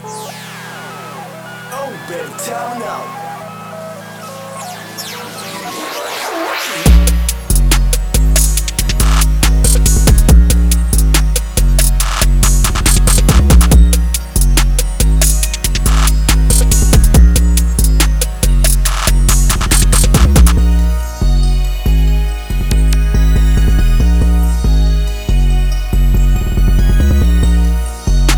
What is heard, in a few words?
beat, rage hyper trap